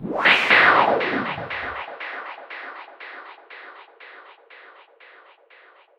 Sweep; noise

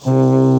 Synth Blowitt; Synths; Electronisounds

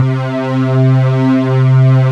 SYN STRGS, JV 1080; Pro-Rec - Synthsonic

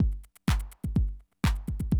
125, Classic Drum Loops

